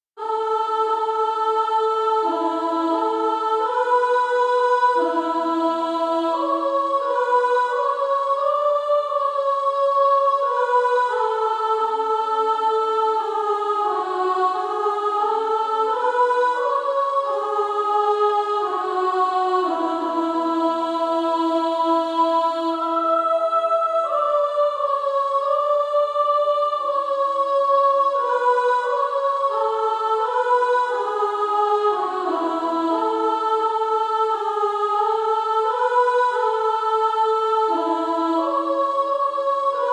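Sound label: Female Choir, soprano 2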